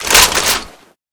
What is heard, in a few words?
mgun close; weapons; deluge